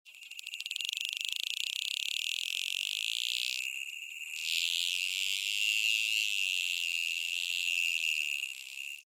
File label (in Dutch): bruinvis